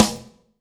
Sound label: AMB SN, AMBIENCE SNARE